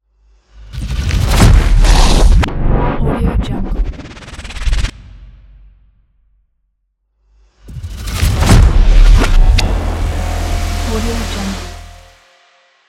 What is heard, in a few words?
transformation logo